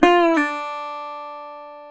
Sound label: GTR, Dobros, National